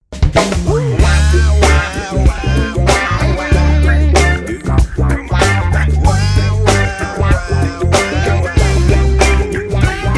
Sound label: Funky Yeah